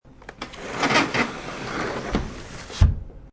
Slide Door Close Slow, Sliding door closes slowly.; Doors